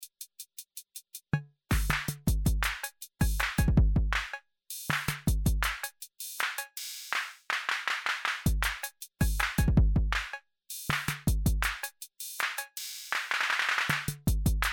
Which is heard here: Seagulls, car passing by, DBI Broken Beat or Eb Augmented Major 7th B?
DBI Broken Beat